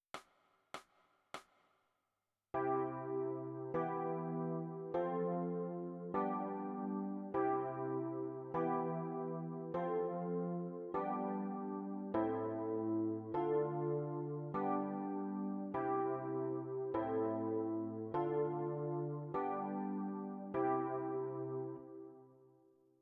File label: E Piano